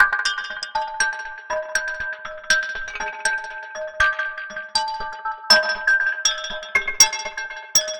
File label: Color Xylophone Loop, Ethnic Instruments; Sonic Foundry (Sony Creative Software); The Electronic Music Manuscript Collection